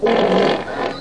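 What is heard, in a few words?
farting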